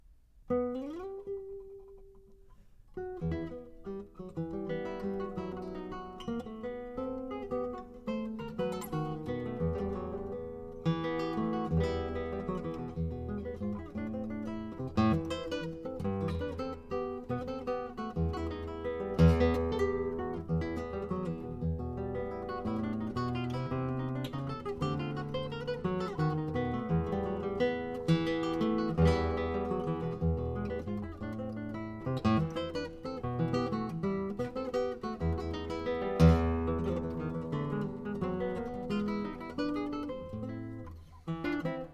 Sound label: Improvisation, Latin Jazz